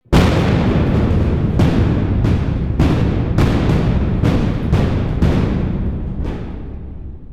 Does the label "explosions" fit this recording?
Yes